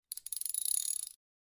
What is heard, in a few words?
wrench, mechanic